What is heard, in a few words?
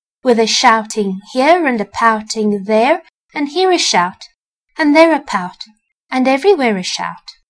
children